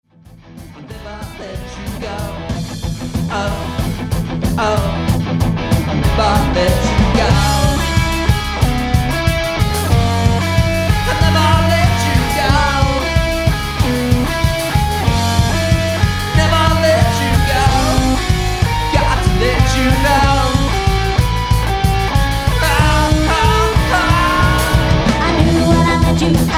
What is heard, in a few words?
band